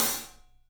Studio Hats, 3DPercussives

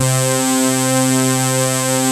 CS SYNTHS; Pro-Rec - Synthsonic; JV ANALOG1

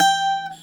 CG HARD G4, Celtic Flavours, GTRHARD; USB Soundscan vol.42; AKAI

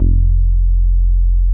JX round F2, Drums; Drums In Your FACE!

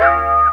BELL, Hip Hop; E-MU Formula 4000 Series Vol. 1 - Hip Hop Nation; Wicked Hits

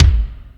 Lotsa Kicks, Drum Machines

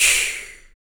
SHAKER, MALE PERC; Spectrasonics Vocal Planet; Jazz FX

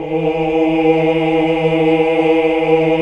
OOH, OOOH Uni; Sound & Vision - Gigapack II CD 1 (Roland)

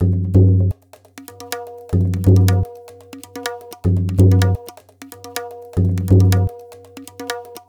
tabla, 125 bpm; Zero-G; Pure Tabla

